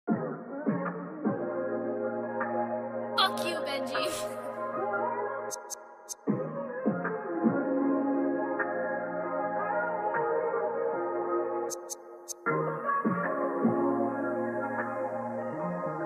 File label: Type Beat